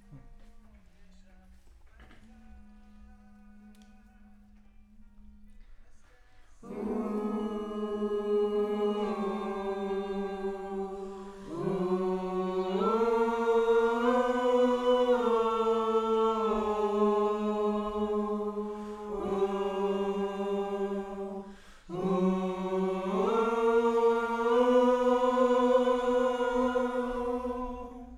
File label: Choir; Decca LR